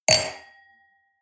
wine glass place table hard, gastronomie; berufe; soundpool

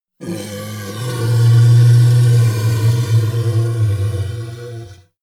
monster inhale low; kreaturen

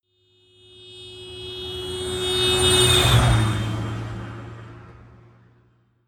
notification honk, autos; verkehr transport

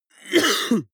cough male single attenuated; koerpergeraeusche

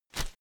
whoosh shredded paper soft; swooshes-swishes

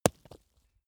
stone impact medium; natur-tiere; steine

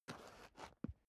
step boots concrete scuff; menschen; schritte

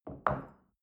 shell place on table soft, gastronomie; soundpool

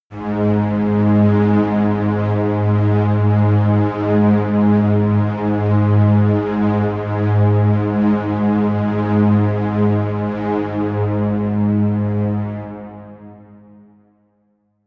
drone simple low string; musikelemente

